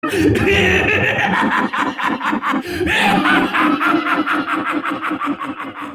laugh

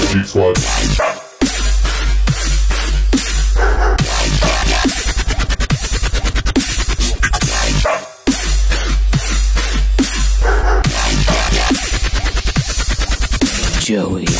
dubstep

